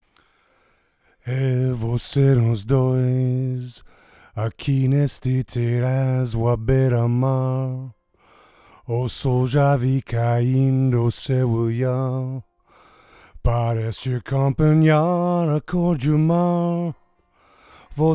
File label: Vocal SFP 60